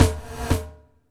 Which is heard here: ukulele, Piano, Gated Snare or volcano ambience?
Gated Snare